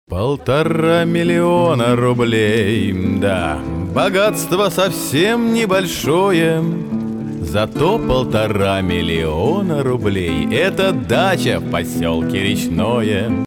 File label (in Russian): vokal